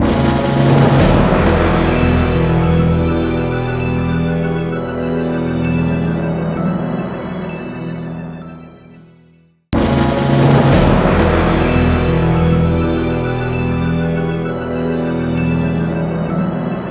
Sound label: Lose Game; super mario strikers gamecube